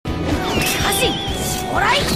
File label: incantation card fire